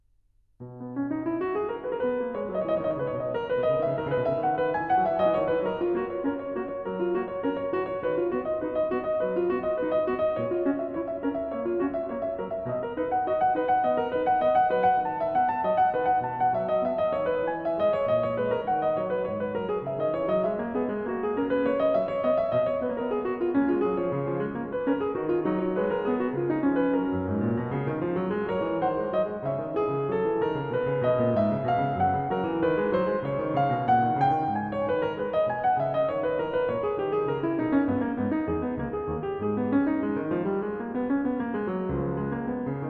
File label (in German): Präludium H-Dur; BWV 892; J.S. Bach; Das Wohltemperierte Klavier Book 2